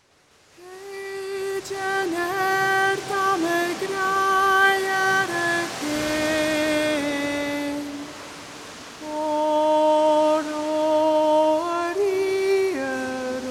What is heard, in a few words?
Gaelic Song